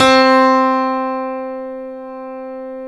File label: StereoGrand, Keyboards